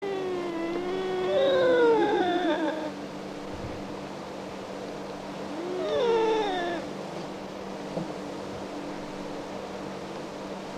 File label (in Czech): kocka divoka